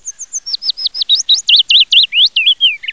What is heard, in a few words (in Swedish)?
lövsångare, phylloscopus trochilus